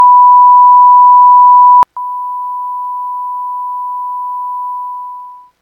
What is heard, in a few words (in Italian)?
Sin 1Khz campionata a 12 kHz senza motore a 12 bit